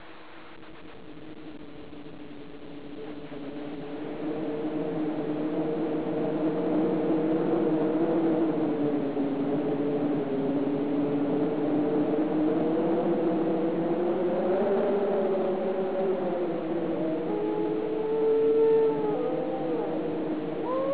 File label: wild dog; golden record